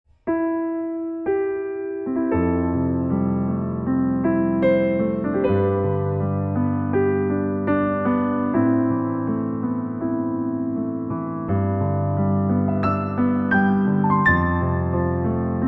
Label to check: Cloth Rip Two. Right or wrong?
Wrong